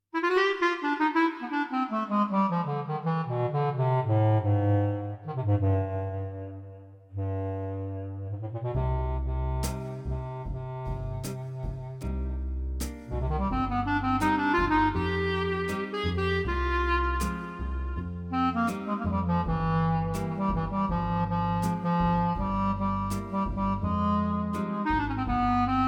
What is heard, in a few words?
Clarinet; Roland V-Accordion soundpack best of brass